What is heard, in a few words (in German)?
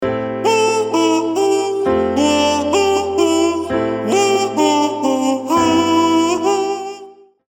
melodie, Vocal; chor aus einer stimme; Verdopple die aktuelle Stimme